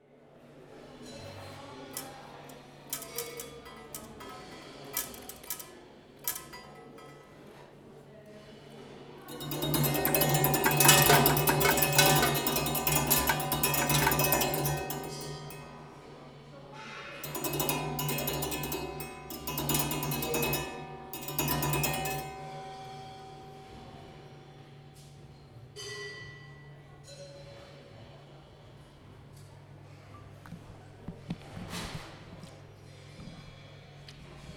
Basel Tinguely Museum

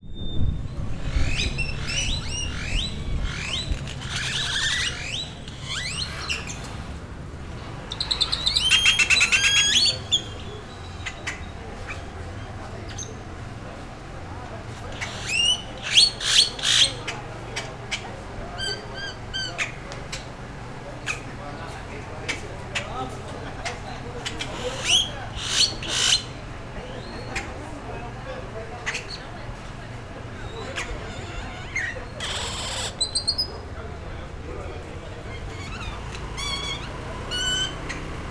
Birds at sunset XY; Zipolite

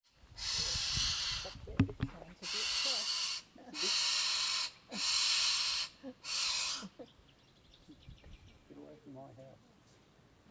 Windjana Gorge

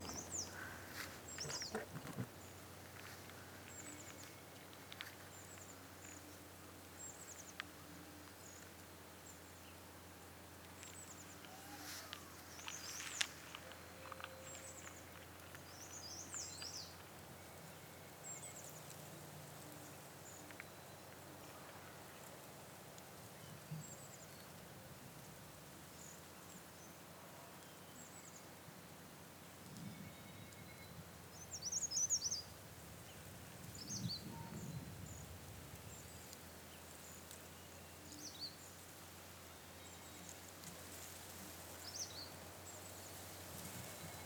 Dalat Forest

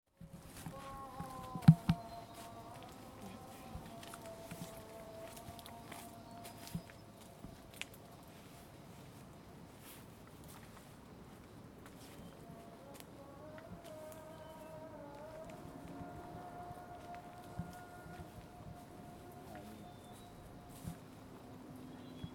Muezzin Tanah Rata

